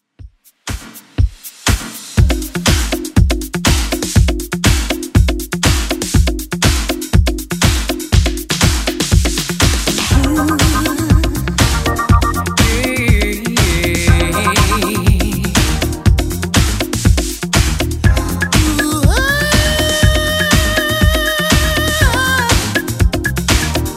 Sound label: 80s Redrum 121bpm